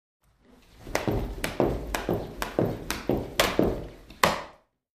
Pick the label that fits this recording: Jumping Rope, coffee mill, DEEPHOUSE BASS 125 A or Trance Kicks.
Jumping Rope